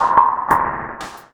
Gothic Bumpers, Fx; Sonic Foundry (Sony Creative Software); Esoterik Beatz

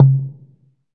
PER, Percussion

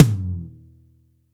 TOM E3 LOU L; Zero-G; Chemical Beats

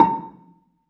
BELA A4, BELAFON, Asian Traditions; USB Soundscan vol.60; AKAI

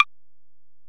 EPerc, Alesis DM-PRO; 300 Drum Machines

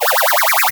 Robot; Sonic Foundry (Sony Creative Software); Underground UK House & Electro

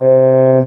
talk box eh

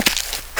GRASS, footsteps; AKAI S-Series CD-ROM Sound Library VOL-6

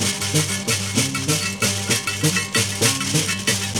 HARP SHAKE, HARP+SHAKER; Zero G - Ethnic

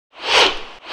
SWISH; E-MU Formula 4000 Series Vol. 2; Dance Set 2

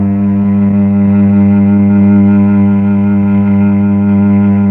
STR, Tron Combo; Roland LCDP09 Keys of the 60s and 70s 1; CMB Melo.Combo

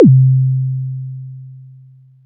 BOOM, BASSDRUM, Techno 138 BPM; Best Service ProSamples vol.54; AKAI

